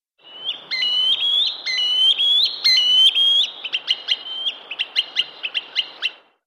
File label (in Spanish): andarrios grande